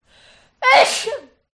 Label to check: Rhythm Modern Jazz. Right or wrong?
Wrong